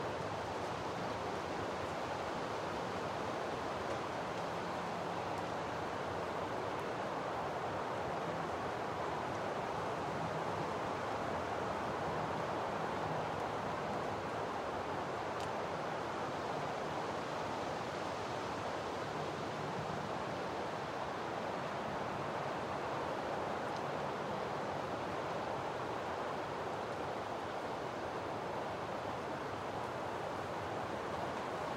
wind

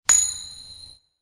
flip; castle-gate

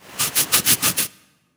scratch; outside castle

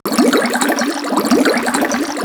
cauldron; outsidehouse

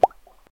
blink

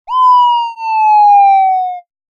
drop

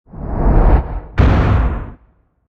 explode

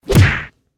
kick

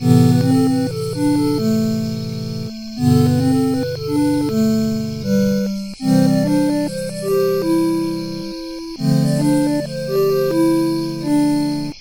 night, music; starting to make music work for osx.

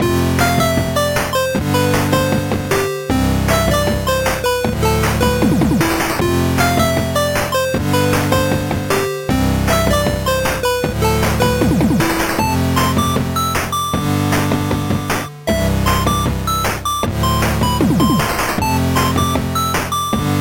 town music

